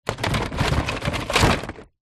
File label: leisure toys plastic pool beach in plastic tub rummage